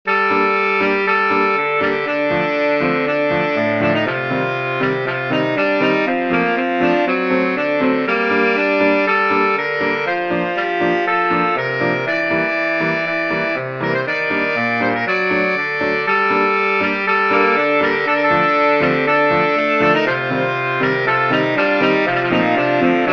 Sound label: Sax Arrangement